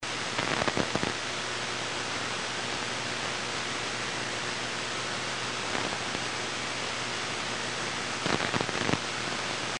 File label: sferics, 60 miles